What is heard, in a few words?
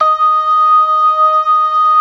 LOW MAN, LOWER MANUAL, Organ; InVision Interactive; Keith Emerson; The Most Dangerous Synth and Organ